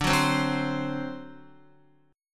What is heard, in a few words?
D 7th, Guitar; D7 strummed; JGuitar